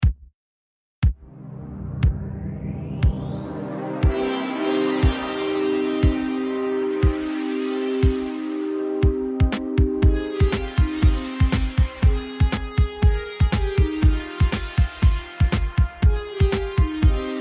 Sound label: song